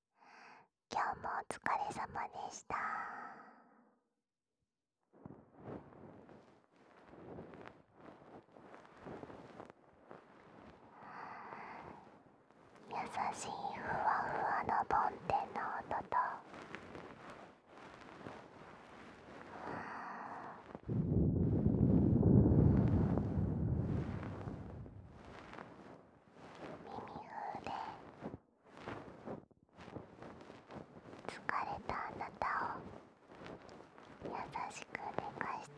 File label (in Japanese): たっ〜〜ぷり梵天＆耳ふー, ASMR